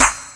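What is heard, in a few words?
claps w cymbal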